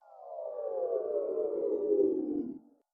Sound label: woooo